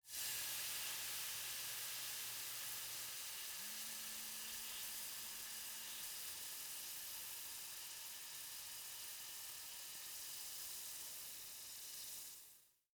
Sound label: Liquid Water Sizzling On Pan